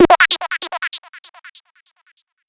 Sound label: Zap, PsyTrance